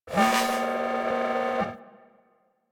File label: Tenno Animal Foundry Working, Machines; Warframe; Liset; Hub